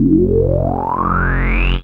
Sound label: FILTR BASS